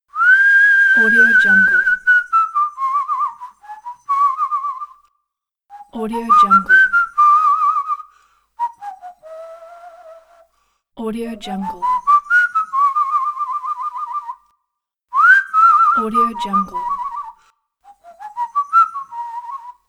tuneless human whistling

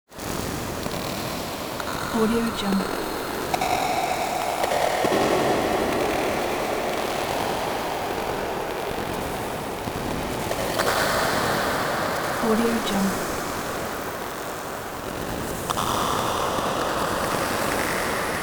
high radiation atmosphere; hillmusicmedia

